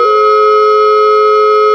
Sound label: sight chase sound; zerosiki; slender